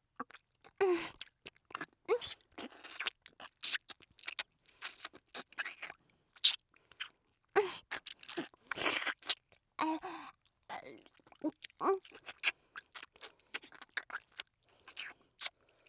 キス(強); おまけBGV

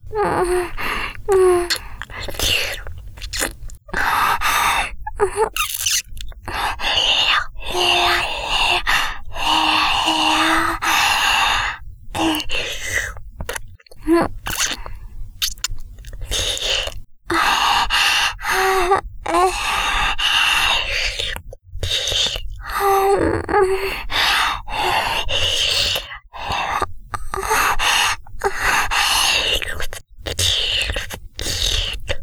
耳舐め, ASMR; ＳＥなし

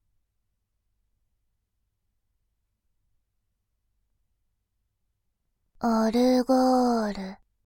オルゴール; バイノーラル左

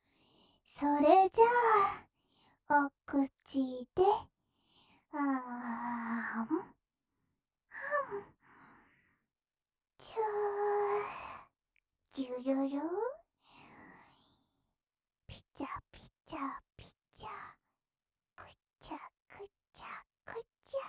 甘噛み・ちゅーじゅるる, ASMR

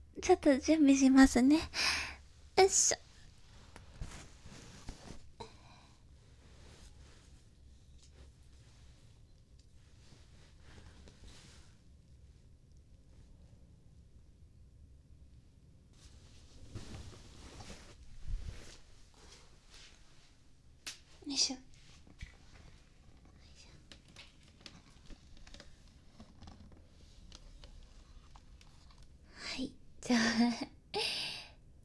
ローション準備 バイノーラル, ASMR; R18ASMR

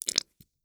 射精音

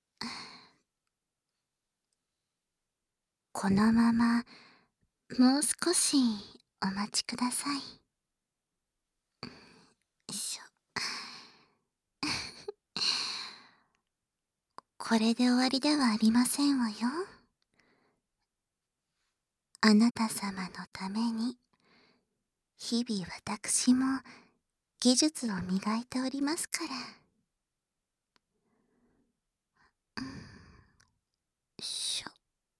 お香でお耳洗浄（バイノーラル 効果音あり大きめ）, ASMR

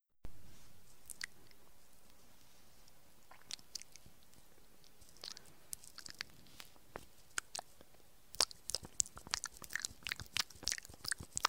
柔らかで気持ちいい耳舐め

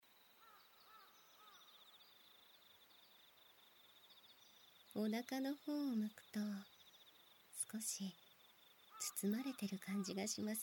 左耳かき, ASMR

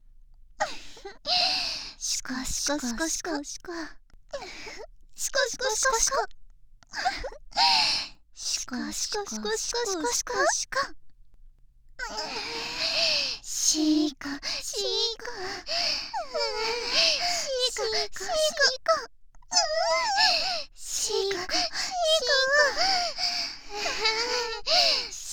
シコシコ だんだん激しく, ASMR

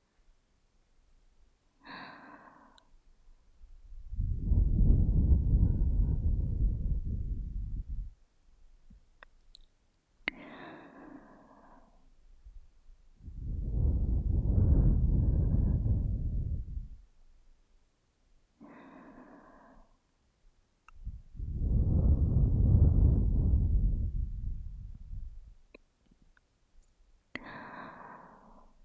耳ふー（右）

